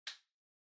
Light Switch American Toggle Far Dry Mid

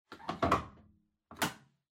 Washer Front Load Detergent Drawer Close

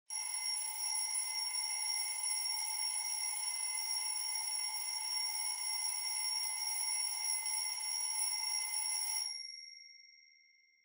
Clock Alarm (Far Mid)

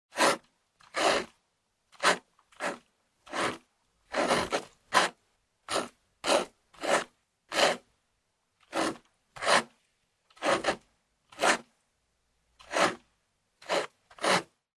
Ballpoint Pen Write

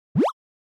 Motion Pop

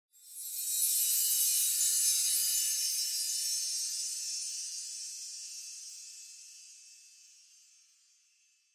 Onoma Sparkle Long; オノマトペ キラキラ

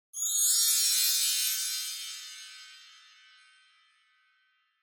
Wind Chimes Reverb Fast Up Wide

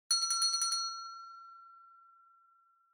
Winning Bell Gentle

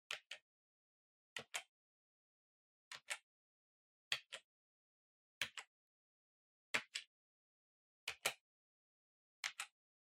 PC Keyboard Far Single Gentle

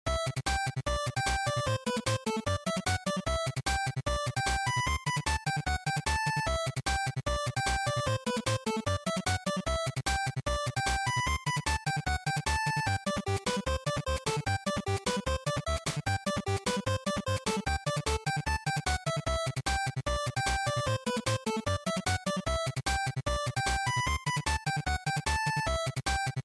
GB Action Boss

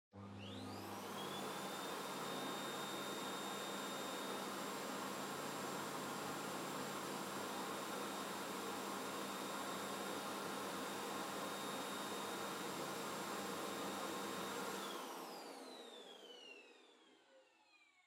Vacuum Cleaner Far Vacuum Floor Soft Mid Still

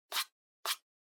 Trackpad Swipe

